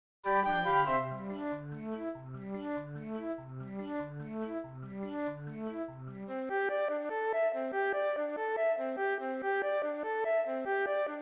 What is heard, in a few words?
Ethereal Flute Quartet; Carousel